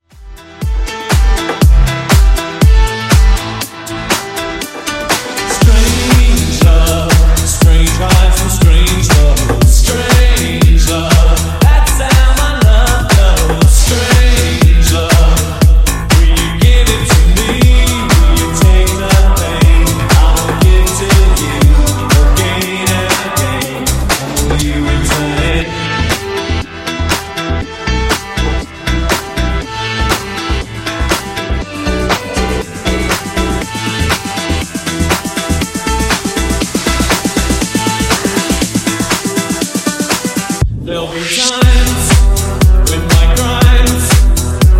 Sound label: Funk Retro Disco Edit 120Bpm